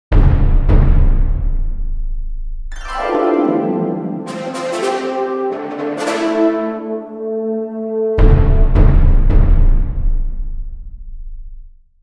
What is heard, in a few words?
Drum Harp Trumpet Fanfare; trompeter harpe fanfare pour annonciation, 13 secondes